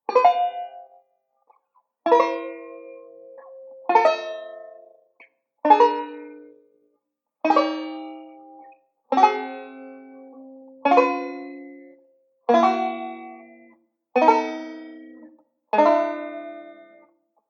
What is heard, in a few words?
banjo; beyond chord melody volume ii